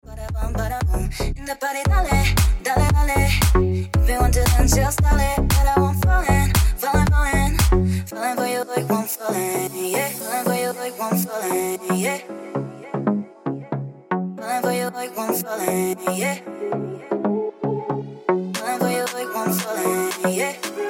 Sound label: Ringtone